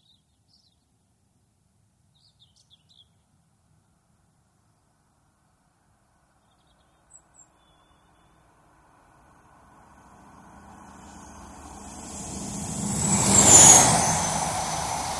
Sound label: Inter City 125, high speed pass left to right; Train; BBC